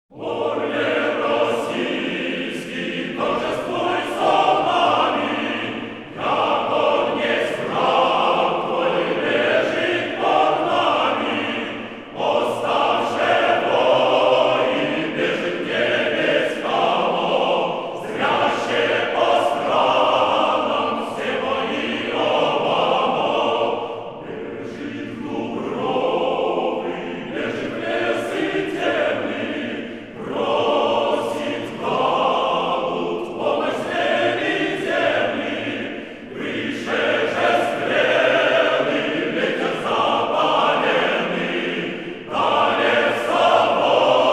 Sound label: Choir; State Capella Choir of St. Petersburg; The Russian Eagle